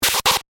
Glitch Short Circuit, Glitch, Short, Circuit, Beep; Glitch Sound Effects